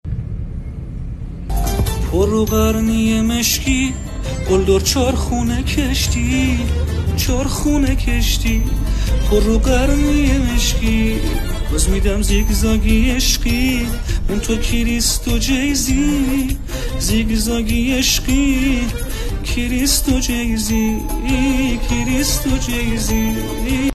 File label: Pop